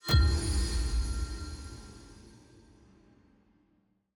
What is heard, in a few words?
patcher notif complete